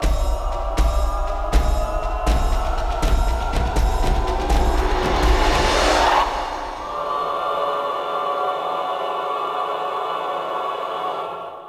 Speed Puzzle

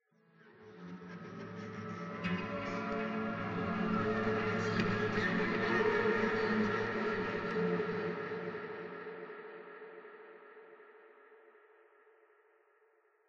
Interior Reverses

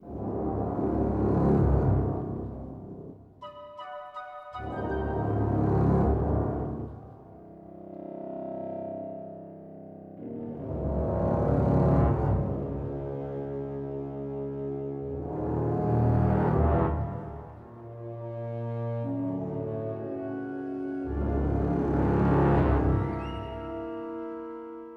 Cinematic Music - Suspense

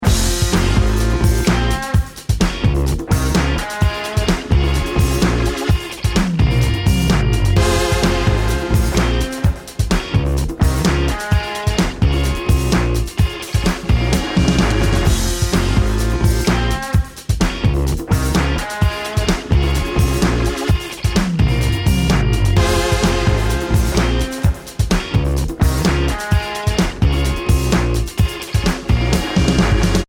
start round

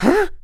Male Surprised; Lucius II: The Prophecy

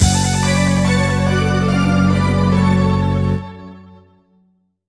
Wireless Battle End, Failure

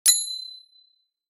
Bell

